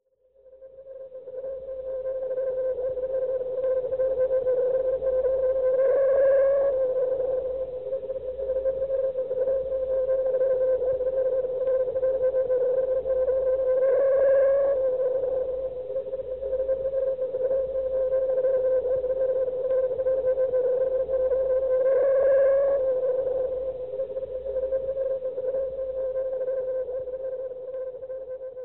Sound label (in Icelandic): vindgnaud